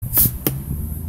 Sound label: shoot; Teemos Dart Shooter Cosplay Prop With Arduino